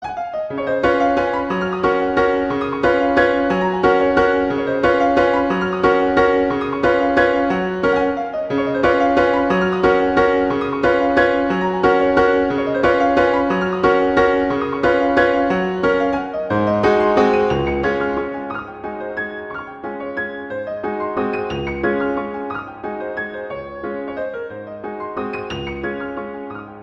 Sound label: Waltz, Gb major; Chopin; Waltzes